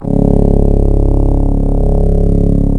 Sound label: C#, strings; Big Fish Audio